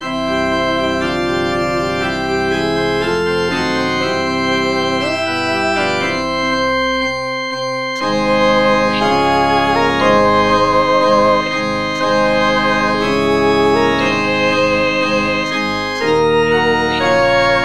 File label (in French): parole sop